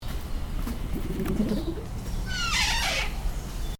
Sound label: fossa chirp